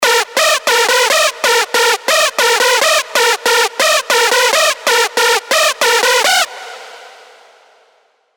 Screechware Exploit, Serum 2 screech; Revealed Serum 2 Screech Pathogen

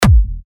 85 E, techno kicks; Karma; Revealed Techno Kicks Vol. 10

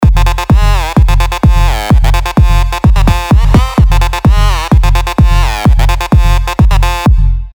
LD, sylenth1 dirty dutch leads; Certified Dutch; revealed sylenth1 dirty dutch leads vol 1

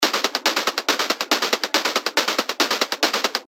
140 BPM, techno top loops; Doorn; revealed techno top loops vol 8

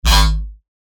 bass shot G#; Trist; revealed bass shots vol 4

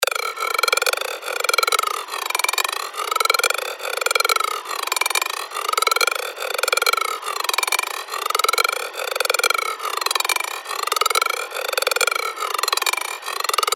FX Loop, 140 BPM; Twisty; revealed fx loops vol 2

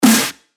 Snare; Vrijmibo; revealed claps snares vol 2

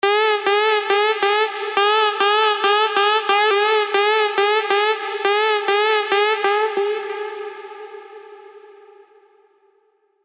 Missed Alarm, big room techno drops; revealed serum 2 big room techno drops vol 4

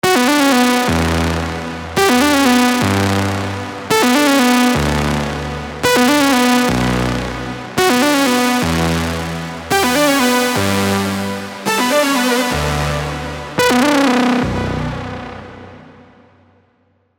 melodic leads; Shatterphase; Revealed Serum 2 Melodic Leads Vol. 1

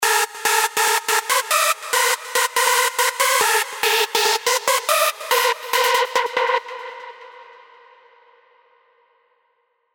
Old School, hard techno; revealed sylenth1 hard techno vol 1

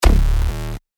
bass shot D; Lava; revealed bass shots vol 4

